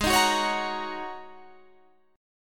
Ab 7th Suspended 2nd Suspended 4th, Guitar, Standard; Ab7sus2sus4 strummed; 16,18,16,18,19,18